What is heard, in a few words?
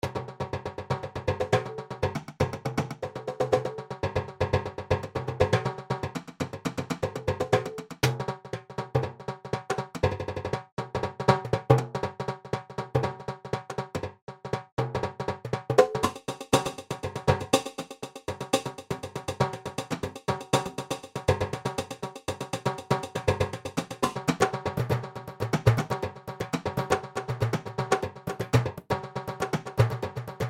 Latin Percussion Kit; Syntheway